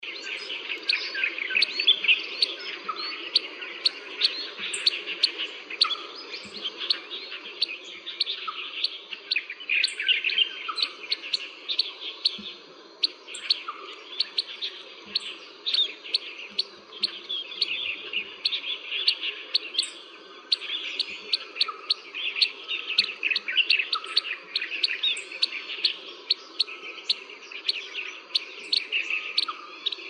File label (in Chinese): 斑文鳥; 梅花雀科/留鳥